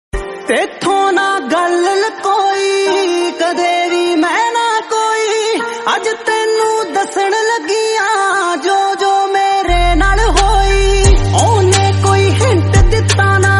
song ringtone, new song

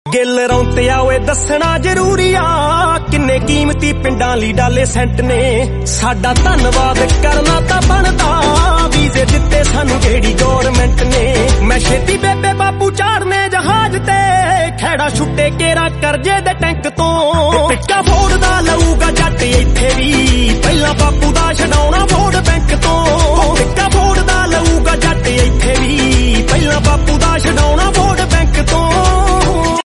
punjabi song

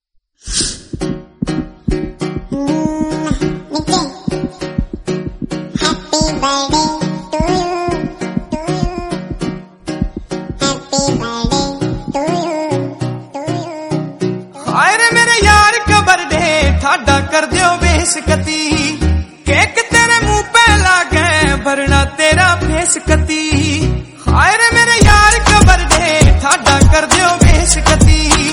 happy birthday hindi

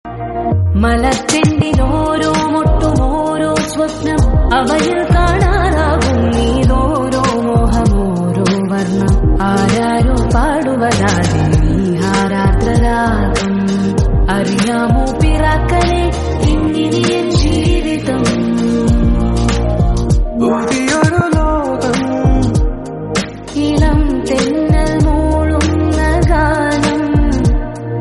malayalam song; hridayam

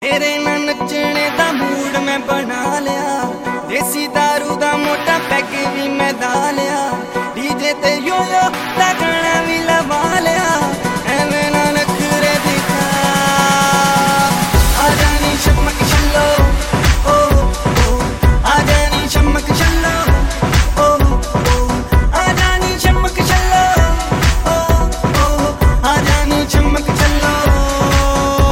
Tamil Remix, Dj; Ra-One

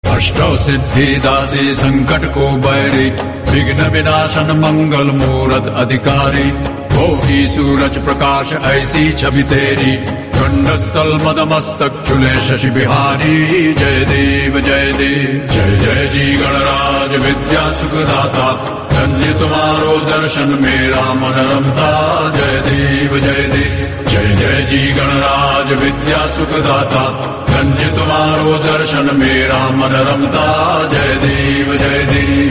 mantra and aarti song; shree siddhivinayak

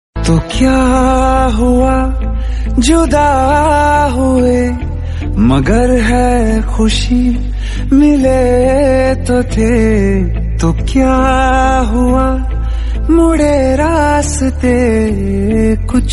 hindi bollywood

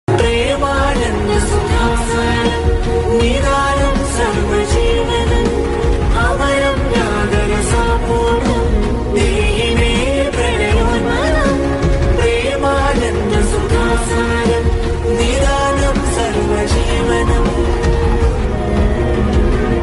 climax bgm; miss shetty mr polishetty

